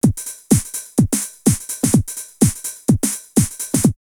909 Swing 126, drumloops, DrumMachines; X-Static Goldmine

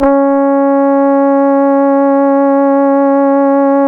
C#, Synclav FM, BRASS; Big Fish Audio

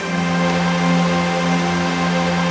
CHRD PAD; Best Service; XX Large Pads; Sample CDs

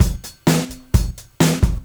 129, Beat Loops; Zero-G; Monster Beats

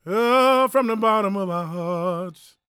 gospel male, vocal; ILIO; Vocal Planet

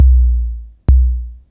SYNCUSSION

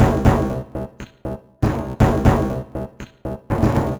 Baladi Distorted Beat; Sonic Foundry (Sony Creative Software); Loop Noir

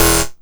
Synth Hit E; Zero-G Trance Inducer; 90 s Sample CDs

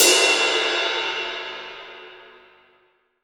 ZIL, 20 ZIL RIDE

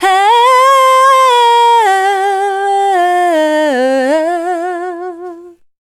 Dance Vocals, FEMALE

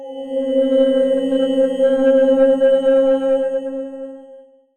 PAD - C; Instrument Oneshots; 99Sounds; Monosounds

